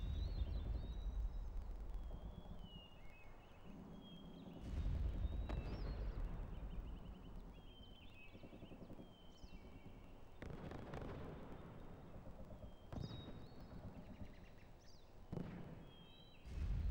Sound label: canyon; WAR